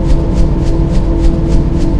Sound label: fan; moria